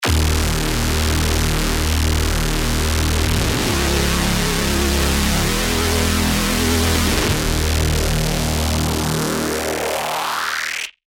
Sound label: Reese; Serum2; Renegade; alonso 18 year anniversary pack